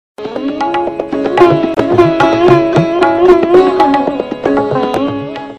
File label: system ready, sitar; stereo